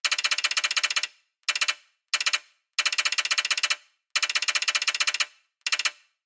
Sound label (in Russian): elektronnye zvuki peredachi dannykh